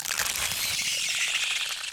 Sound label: Infested Goblin Death Underlay